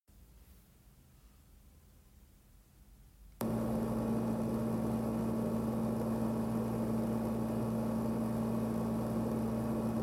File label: Lectrofan Micro 2 Fan sounds max volume